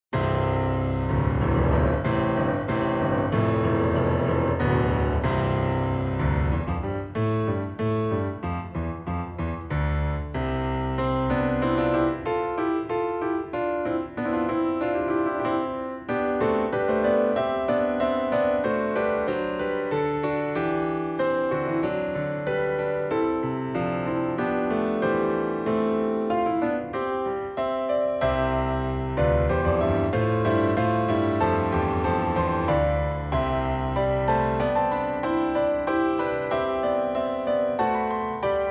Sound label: hallelujah amen piano